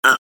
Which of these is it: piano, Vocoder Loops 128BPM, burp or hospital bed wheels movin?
burp